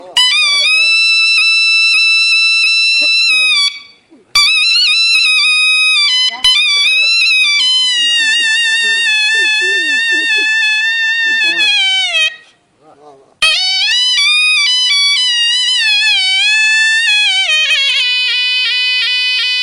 Desi Flut

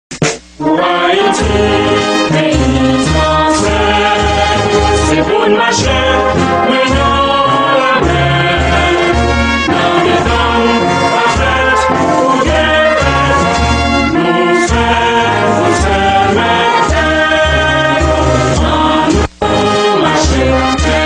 National Anthem

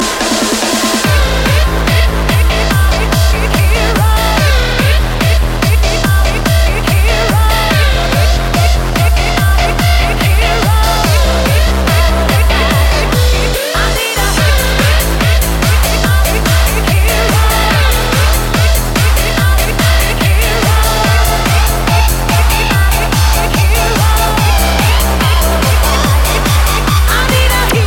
Bass

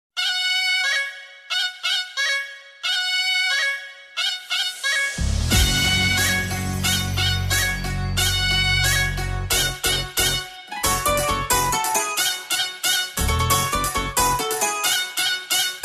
Beats

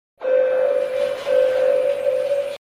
Announce alarm; SCP

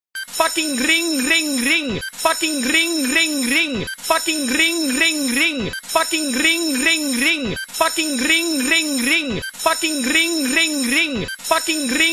ring ring; Smg4

